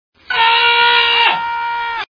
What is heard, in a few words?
aaaaaugh